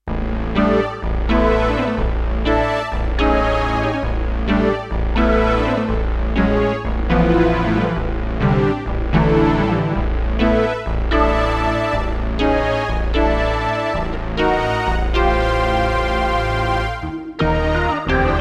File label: Synth FX